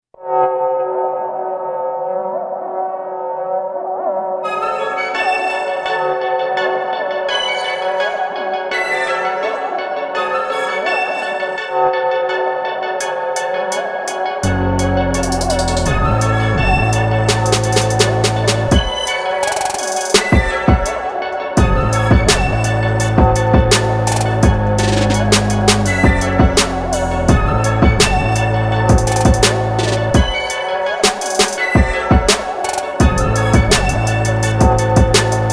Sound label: Dirty Bellz